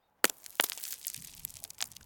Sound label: Single Small Rocks Tumble Cliff